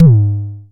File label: analog pitched, kick; Ableton Foundation FreePacks